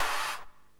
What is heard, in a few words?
Tails, Drum Bits, Verb Tails